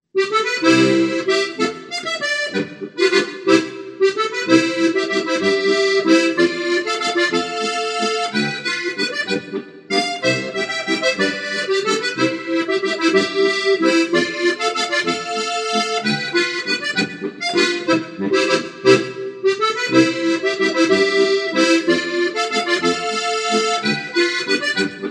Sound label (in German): Blumenwiesen-Landler Playalong